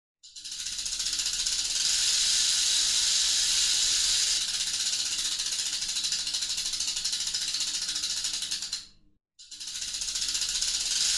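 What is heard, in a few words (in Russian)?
гремучая змея трещит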